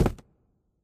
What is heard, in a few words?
phys cube, physics